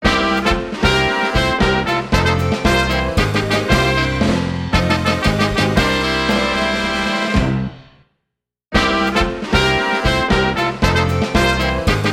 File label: Bayou Complete; the princess and the frog; Frog Music